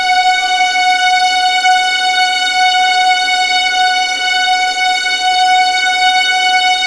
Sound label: F#5, String Collection; East West; The Ultimate String Collection